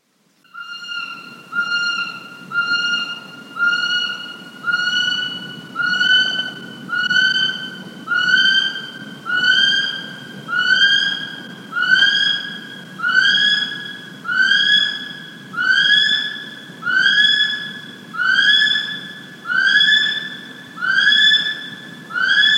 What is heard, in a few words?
call kiwi male; North Island brown kiwi; The calls of the North Island brown kiwi are distinctive, full of character, and loud!